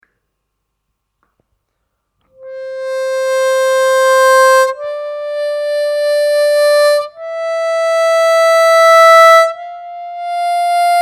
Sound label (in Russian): +4-4+5-5, губной гармошке; одиночными нотами